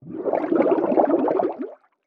Underwater Water Splash Gurgle Bubbles Air Valve SCUBA